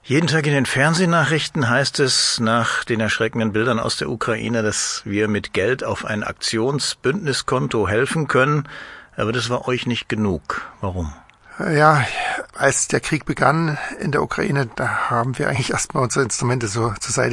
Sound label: classic brass